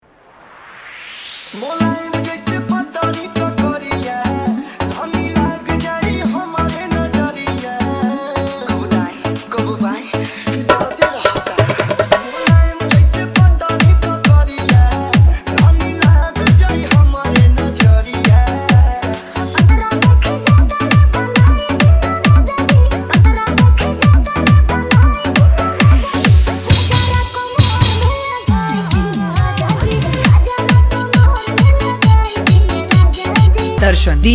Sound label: Bhojpuri Dj Remix Songs Hard Bass